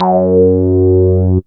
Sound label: SWEEP F#1; Best Service Alpha Dance vol.2